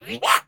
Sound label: Banshee Attack Vocals